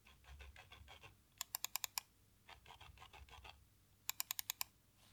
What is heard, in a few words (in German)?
CM MM712 Scrollrad